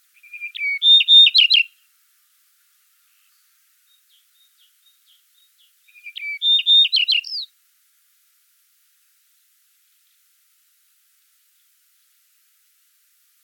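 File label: yellow-breasted bunting voice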